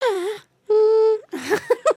pose, emotes

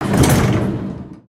platform arrive; avant gardens

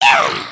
air guitar

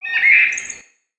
Peaceful Bird One shot

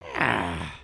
Attack Idle, creatures organic

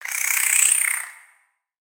Spider Idle Bored; creatures mechanical; Lego

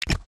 brick no connect bip; build mode

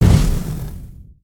Fire On Hit; Spinjitsu

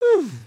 sleep start, emotes

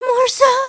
beg

